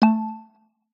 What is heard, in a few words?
ranked